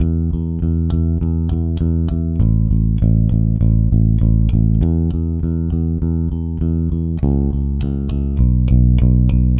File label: Rock, Bass; Bass X